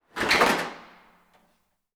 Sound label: Corrugated Iron Sheet; 99Sounds; The Warehouse